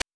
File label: jump; Pico